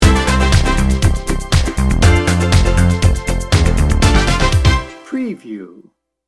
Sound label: happy and festive sting bumper